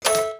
plink goal tier 1; ui; economy

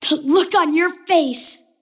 deny pain; kidvoker; dota; invoker

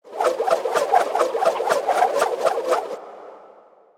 boomerang; weapons; dota; hoodwink

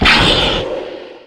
web cast; dota; broodmother

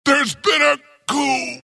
death long; dota; skeleton king

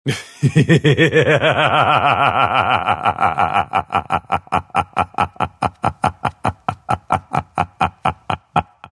pangolin laugh; dota

